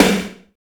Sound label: SNR, Snares; Roland - Rhythm Section; Snares 1; Sn Modules 1